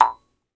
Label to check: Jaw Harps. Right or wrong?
Right